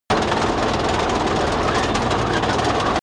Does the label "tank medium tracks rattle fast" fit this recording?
Yes